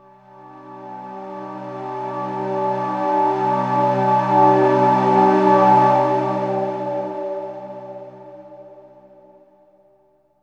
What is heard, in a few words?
synth pad ambient 160 C; Sphere